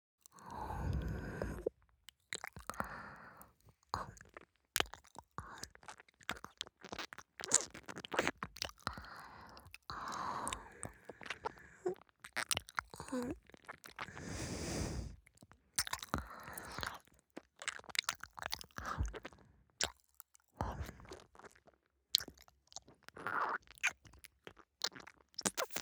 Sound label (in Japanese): ドSロリ耳なめ 右; 方言メスガキ姉妹なんかに絶対に負けない！！; 方言メスガキ姉妹おまけ; ドSロリソロ差分